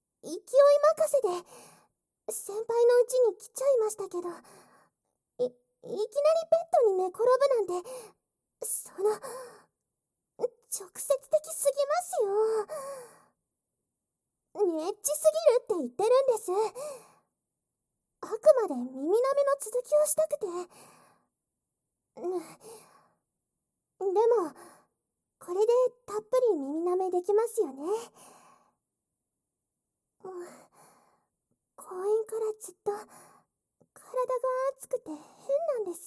エッチな耳舐め, ASMR; 効果音無し

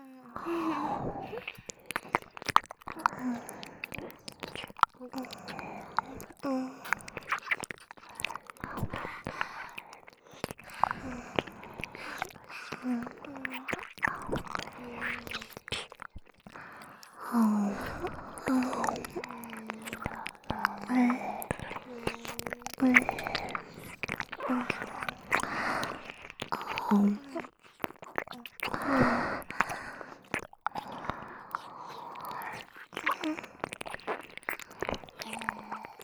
舐め音全部のせ 両耳舐め+フェラ

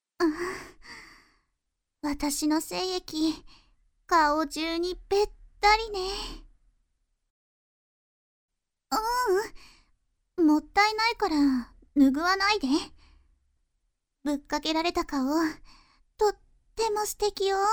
フェラ（SE）

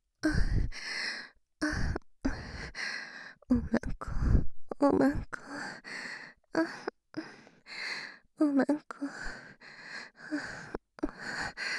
喘ぎ; 淫語ヘヴン; 左右反転差分

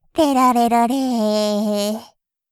舌だし失神風; 失神中

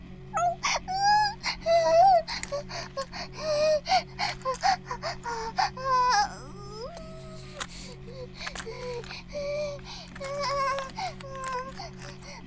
バイブ放置; イクたびにわけて

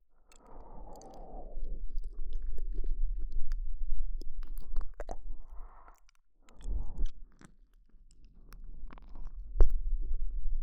ぬりゅぬりゅ…, 左耳

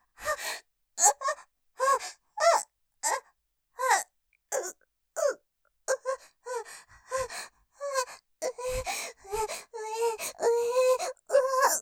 喘ぎ 意識ふやふや; 挿入されて意識ふやふや; ステレオ

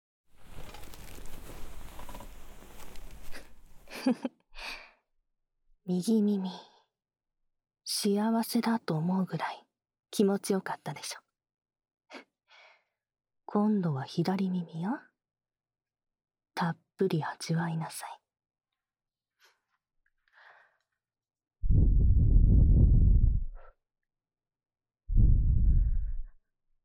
言葉責めをされながら耳舐め（左耳）

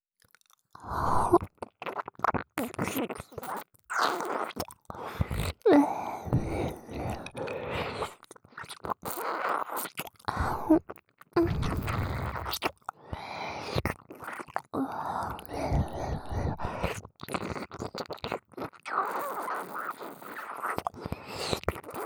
強めにすすり上げながられろれろ舐める, 耳舐め; おまけBGV

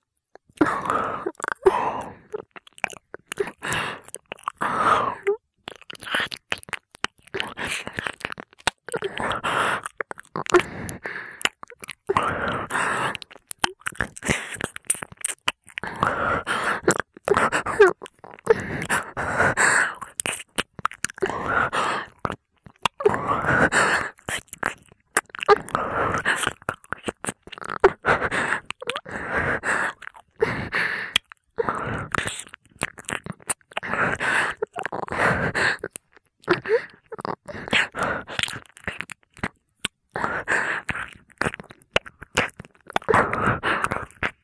あえぎ耳舐め 左

